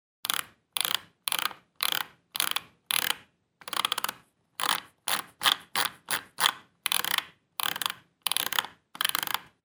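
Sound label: sound of mouse wheel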